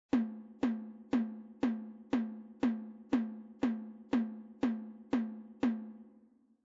Metrum

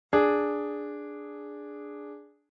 Intervalle verminderte Quinte D; Reine Verminderte Uebermaessige Intervalle

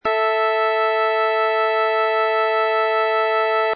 Quinte; werckmeister - stimmung

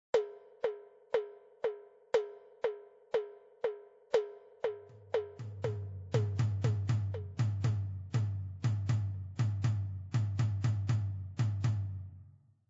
Rhythmus

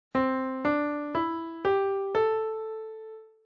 Tonleiter C Pentatonisch; Weitere Skalen; Tonarten

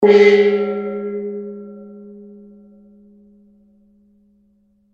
Mischklang

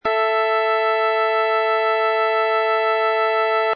Quinte; werckmeister - stimmung

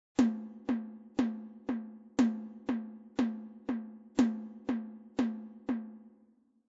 Takt Vierer

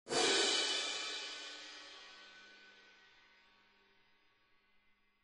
Becken; unmittelbar gegenschlag; Instrumentenkunde

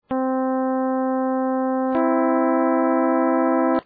Pyth Terz; pythagoreische stimmung

